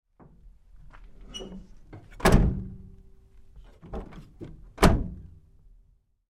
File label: Truck GMC Savana Door 2nd Side Open-Close Lite; 2010 GMC Savana; Trucks; Big Room Sound